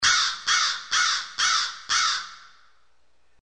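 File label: crow, ringtone animal; kalagh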